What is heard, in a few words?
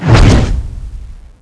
attack, melee miss; The Monster Plane; kolmods